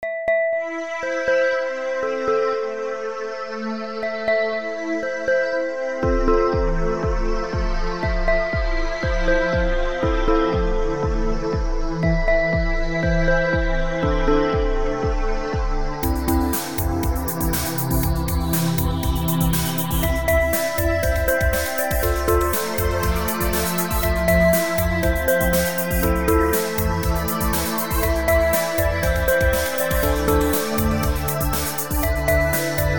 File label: Blues, home made Music in FL Studio